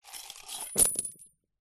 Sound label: foley money coins tip out of metal tin onto carpet; zapsplat